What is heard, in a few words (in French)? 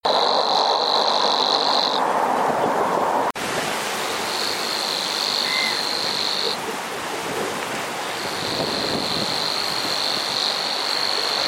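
locustelle luscinioïde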